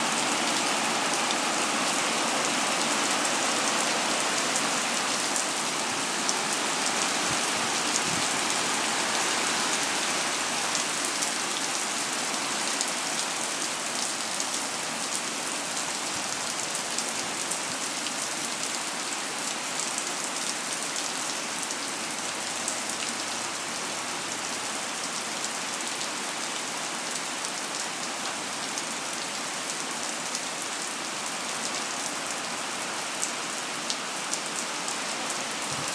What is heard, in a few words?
songkran storm ko tao thailand